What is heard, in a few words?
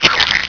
EGGCRACK